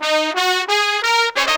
Phantom Horns, 132BPM; Zero-G

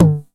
909 NOISY; Wizoo - Platinum 24 Electronic; 909 NOISY P1

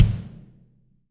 BD, Real Mega Drums

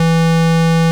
OB SQRLD E2, OBERHEIMS

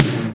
drum gate, Analog Drums; Roland - Rhythm Section

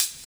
Open Hhat, HiHat; Equipped Music; Loungin House

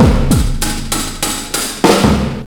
97.2 bpm; BONITA; 90 s Sample CDs; Planet of the Breaks

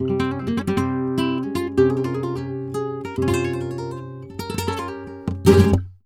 flamenco guitar, tango

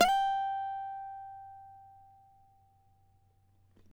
CAVAQUINHO SLIDE, Guitars

